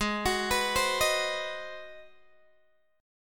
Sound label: Ab 7th Sharp 9th Guitar Open G, chord